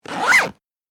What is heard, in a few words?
wrong answer